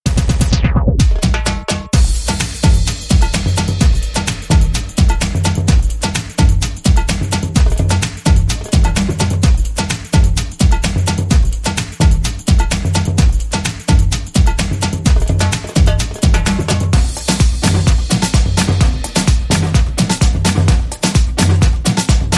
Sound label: Beat